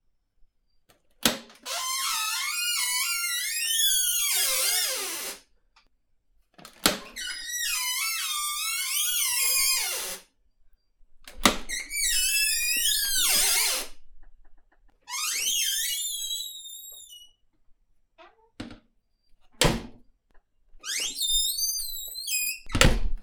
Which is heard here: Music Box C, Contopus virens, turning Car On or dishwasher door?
dishwasher door